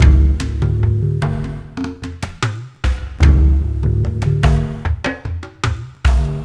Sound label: Fire Loop, Percussion Loops